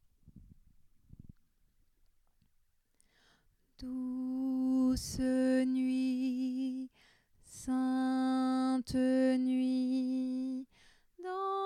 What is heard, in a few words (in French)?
douce nuit basse